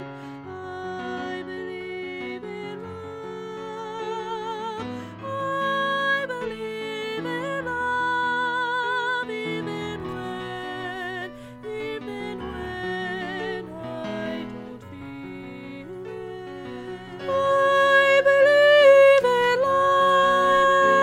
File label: Soprano Descant